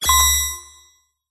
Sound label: science fiction cyberpunk robot or equipment beep; zapsplat